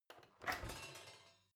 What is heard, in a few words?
oven open baking sheet ringing soft